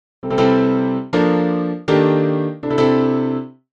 accords enrichis

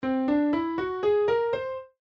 gamme par ton

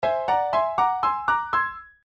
degrés en gamme par ton

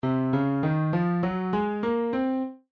mode de si base do

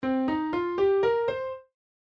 Do pentatonique

